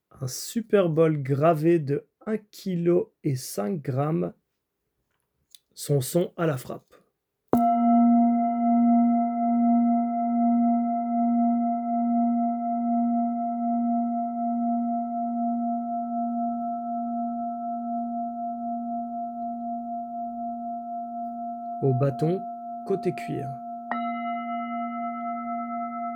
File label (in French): Bol Tibetain Grave Martele 7 Metaux Note Si Bemol